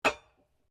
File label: SLEDGEHAMMER METAL IMPACT MEDIUM